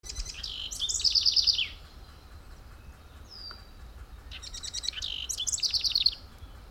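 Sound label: finch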